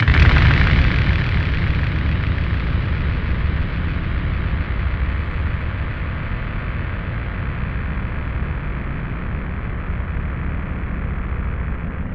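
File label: bass drum explosion; BASS DRUM FX; Zero G; Malice In Wonderland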